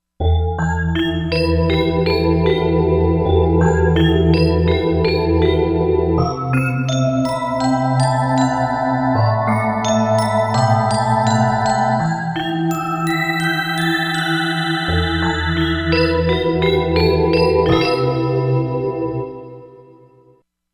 Digi Drops, Korg MSC-07S Synth; Korg MSC-07S Synth 2

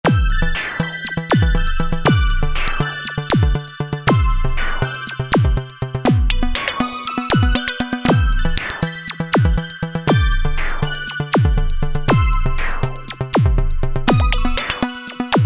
Analog World, Alesis Micron; Factory Setups

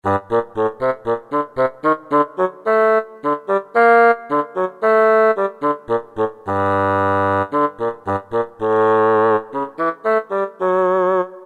BASSOON; Roland SN-U110-06

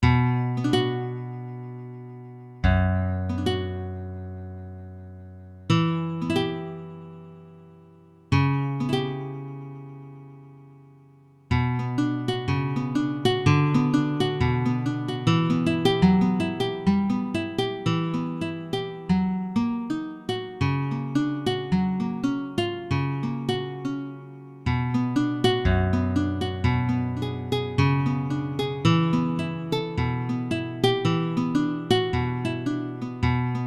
Nylon 6-String, Acoustic Guitars, Yamaha TX16W

